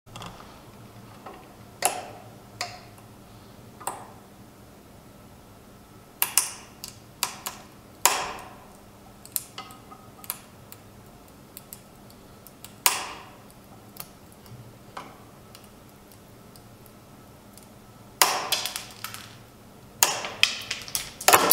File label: Open pistachios